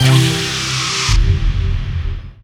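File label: synth, Production Effects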